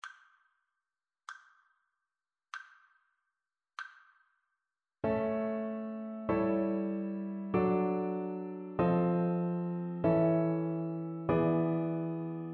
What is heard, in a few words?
harmonic dictation; eartraining